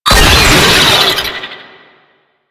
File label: Brachiolyst Player Spotted, Vocals; Enemies; Warframe; Proto Infested